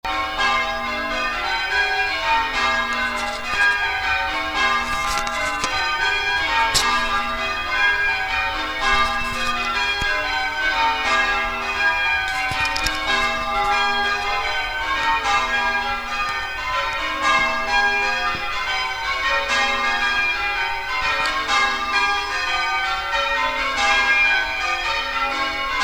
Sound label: stedman triples, bells; upham